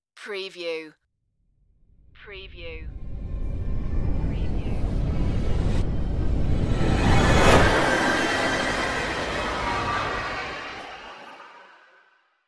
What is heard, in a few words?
evil dark rising